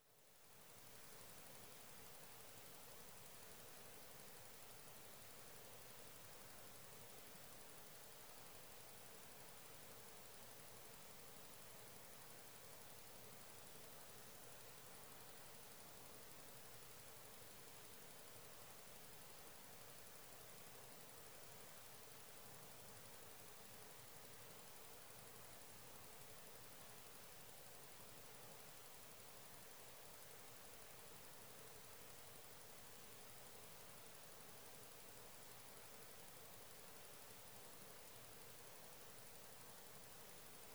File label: Sage Thrasher